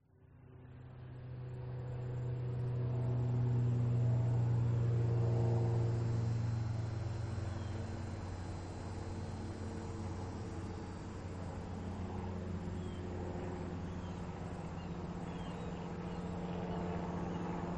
soundscape residence early morning several aircraft flying by; omar alvarado